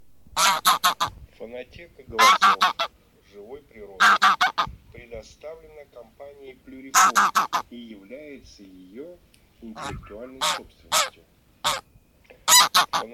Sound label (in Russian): Гусь серый Oca Selvatica; plurifon